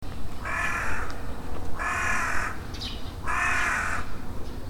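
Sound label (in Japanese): hasibosogarasu, large-billed crow; kubo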